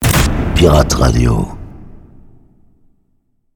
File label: sweeper medium